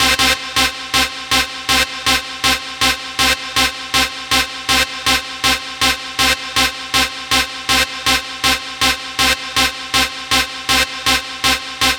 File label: Dance Synths, Hardcore Loops, Synth Loops